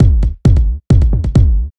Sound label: Dance Drumloop